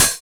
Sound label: Roland Juno D 808 & 909 Kit OPEN hh